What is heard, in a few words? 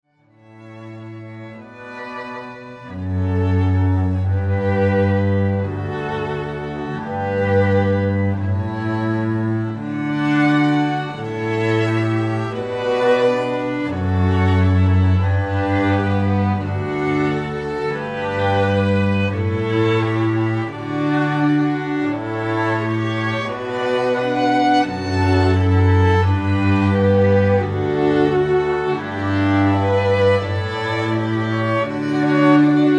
Classical